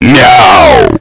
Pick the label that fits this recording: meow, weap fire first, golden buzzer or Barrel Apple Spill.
meow